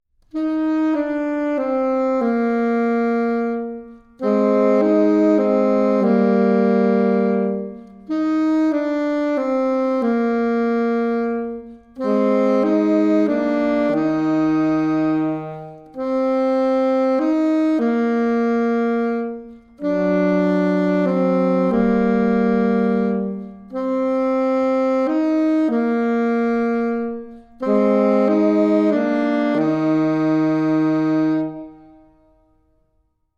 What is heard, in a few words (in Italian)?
Saxo Tempo